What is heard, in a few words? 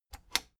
plastic button latch scratch disable fast